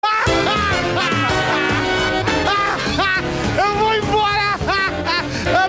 risada

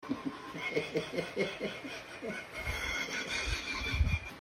risada

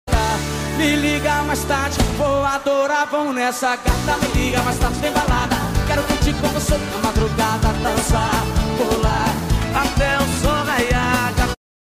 balada

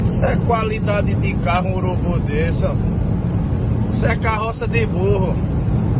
carroca de burro

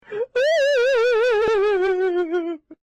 choro meme

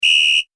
assobio, assobio meme; flashscore

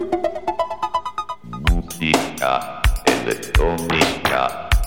musica eletronica

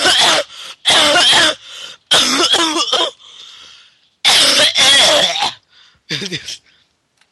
tosse

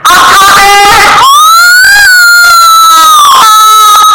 gemidos

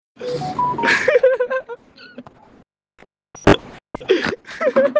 risada

